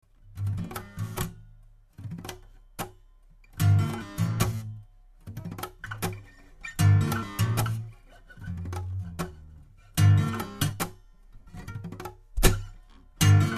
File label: Slap, guitars